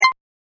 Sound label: coin count; kickbuttowski; mellowbrook